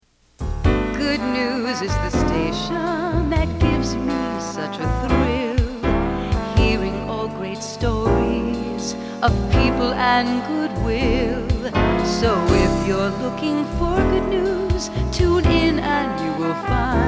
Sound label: Jingle Blues